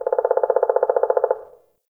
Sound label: Tree Pine Pecker; Ambience; Trees